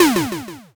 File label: explosion s